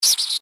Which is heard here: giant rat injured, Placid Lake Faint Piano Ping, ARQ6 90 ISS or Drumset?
giant rat injured